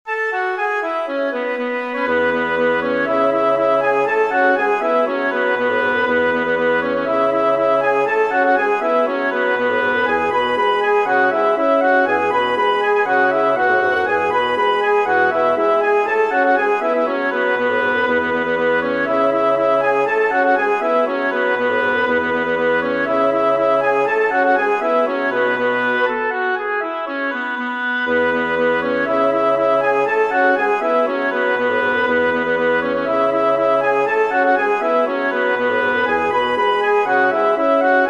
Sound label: galway city for woodwind quartet